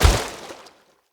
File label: Step Water, Animals, Foley, Steps; Bromius